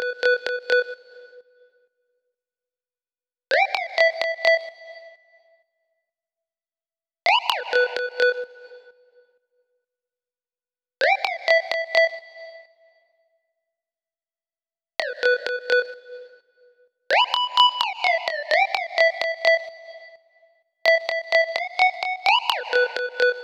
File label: Sine Synth, 128 BPM; Vengeance Samples; VTDS2 Song Kits